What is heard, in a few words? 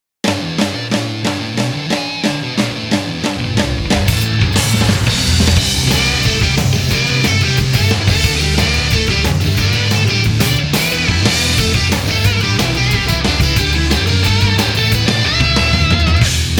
Instrumental; JoJo's Bizarre Adventure